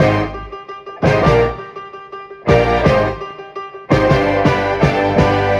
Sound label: horns, loops, phonky